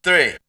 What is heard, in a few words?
Vocal; ZERO G - Datafile DISC 2